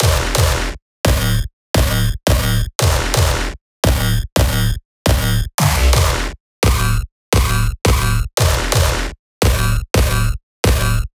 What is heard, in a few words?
synth loop absurd B m